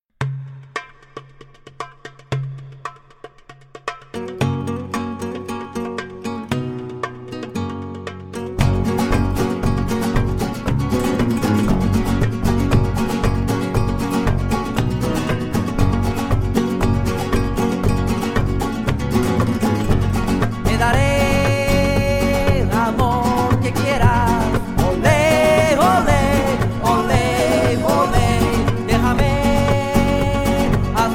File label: Rumba; Bohemia